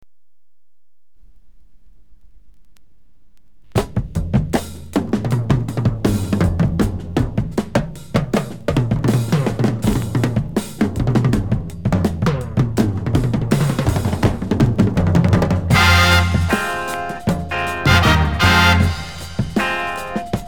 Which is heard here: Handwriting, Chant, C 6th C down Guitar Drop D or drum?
drum